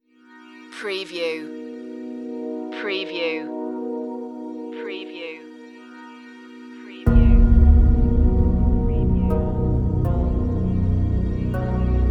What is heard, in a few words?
into orbit no drums